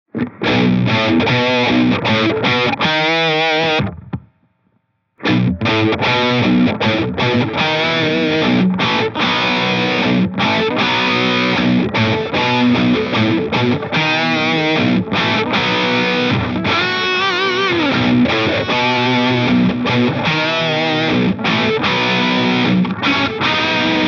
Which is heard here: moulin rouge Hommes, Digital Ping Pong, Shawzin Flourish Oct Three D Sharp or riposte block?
Digital Ping Pong